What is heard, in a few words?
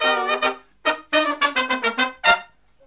Brass Riffs; USB Soundscan vol.29; AKAI